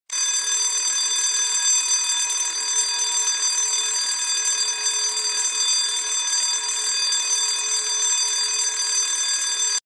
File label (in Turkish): okul telefon zil sesi